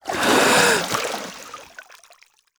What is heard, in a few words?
Exit Water